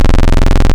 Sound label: Modular 3 VCO Saw, wavetables; Animoog